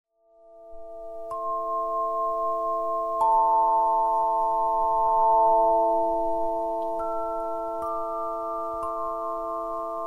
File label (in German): Klangleiter, klangspiele; Raphael